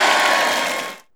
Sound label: GAME CHEER BREAK SLIDE; maimai